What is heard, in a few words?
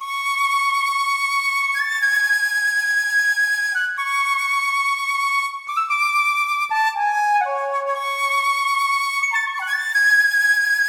flute; Zomboid; EntertainYourself